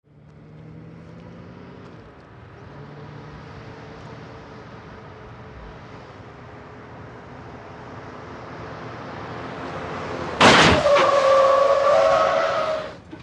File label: Auto Blow Out Skid, blowouts